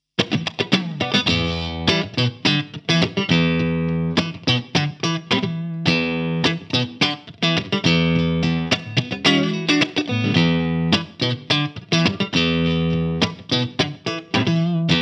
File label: Orange Rockerverb 50H MKIII Strat Clean